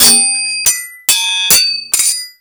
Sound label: SWHIT, SWORDHIT; Total Control - Action Cutz